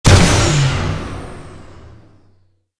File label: attack; CyberCodeOnline